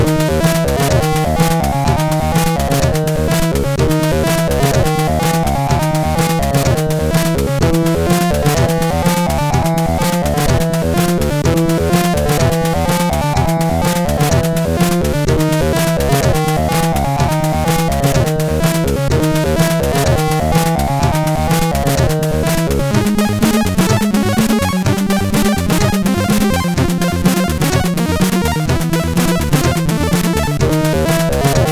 Techno, MOS6581R4; hvsc

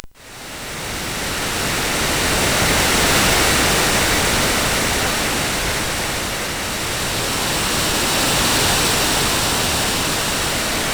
Sea Music, CSG8580R5; hvsc

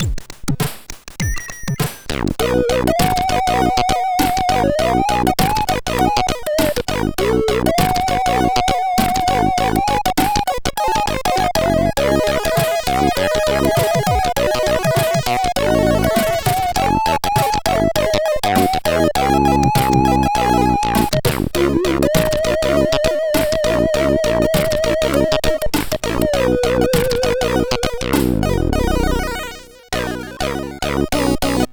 Jazz and Weird Tekno, CSG8580R5

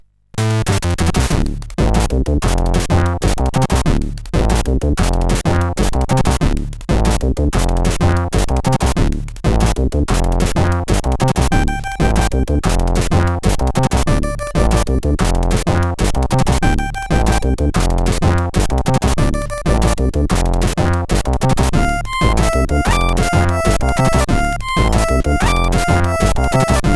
Amiga Crackintro, sid CSG8580R5

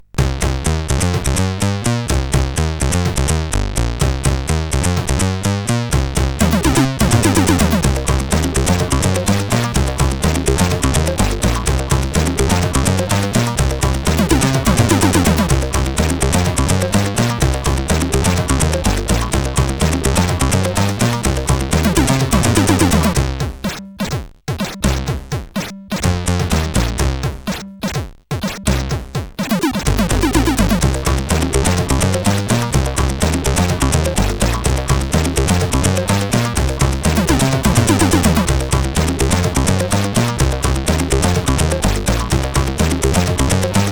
Thats Bass, CSG8580R5; hvsc

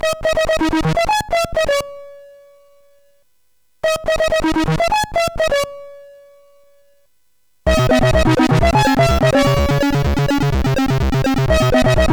Rave Techno, CSG8580R5; hvsc

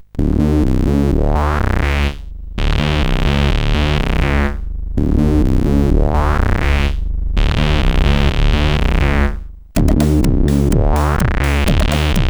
sid, CSG8580; hvsc

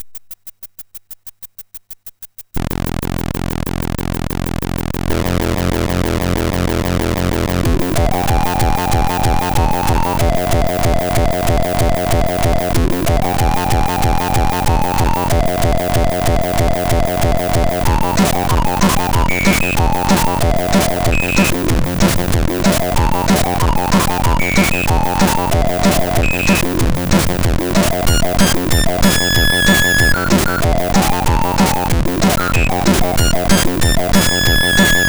sid MOS6581R4; Shizzo

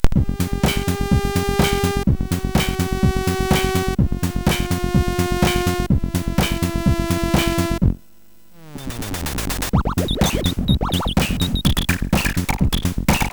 Cosmic Voice; MOS6581R4; Signor

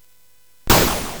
Dark Castle, CSG8580R5

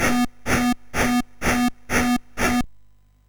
Final Synth Sample, MOS6581R3

